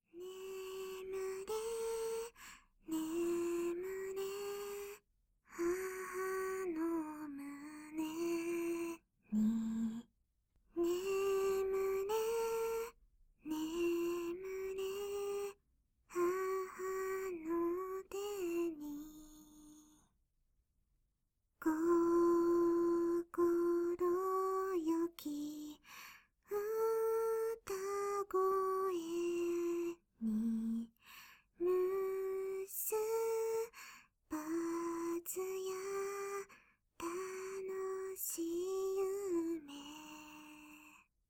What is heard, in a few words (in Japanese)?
子守唄